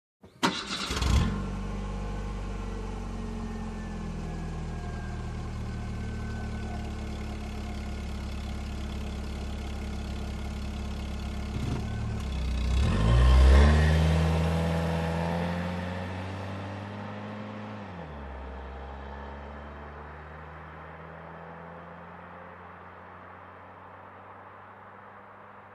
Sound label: Car engine ignition and driving away